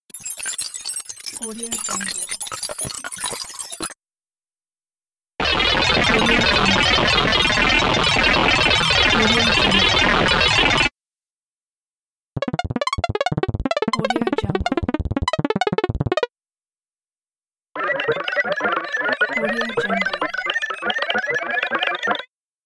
retrieving data

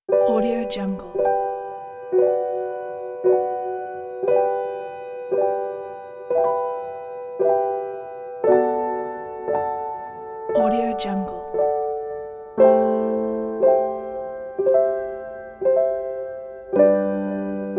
christmas love

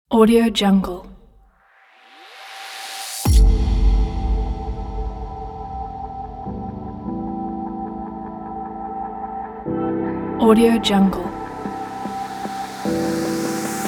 future bass background

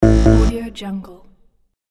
incorrect answer

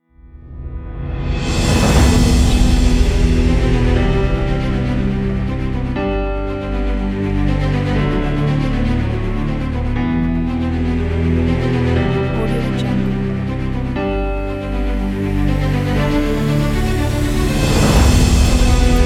epic

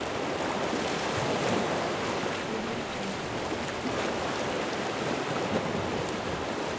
heavy sea waves hitting breakwater loop

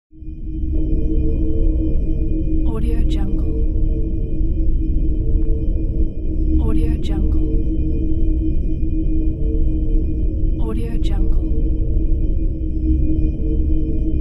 deep space travel background

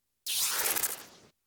fluttery static pass by

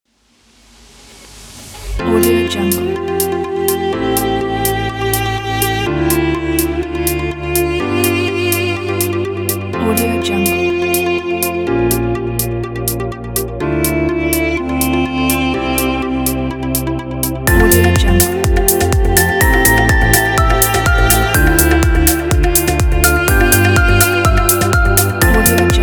asian corporate

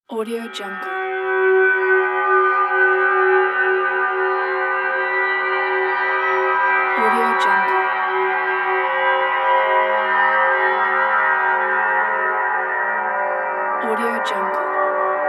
horror ambience

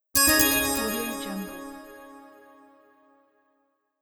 bright short flash logo

